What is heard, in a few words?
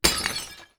Smash Pot; Game Essentials